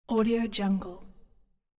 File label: bang